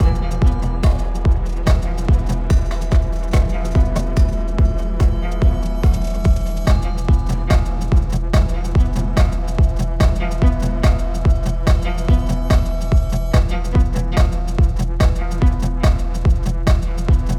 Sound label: Music Gameplay State Tension Dancefloor; Music Hideout2; H2 New Level Area=Dancefloor Init